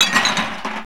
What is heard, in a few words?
TRASH; AWE64